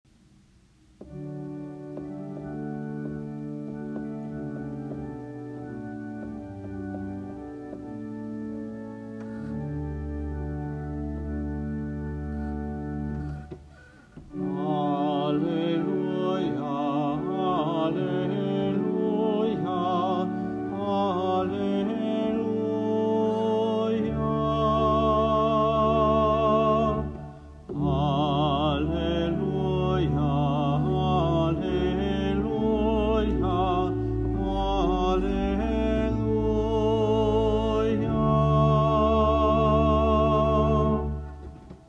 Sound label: wedding gospel acclamation